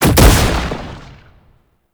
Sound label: Brood Impact; Warframe; Oraxia